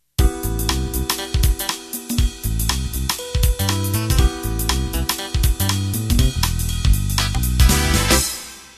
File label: Jazz Funk End